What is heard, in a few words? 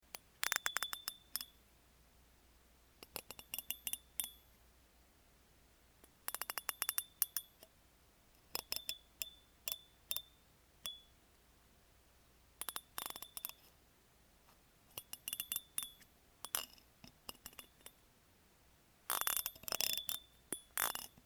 mic pick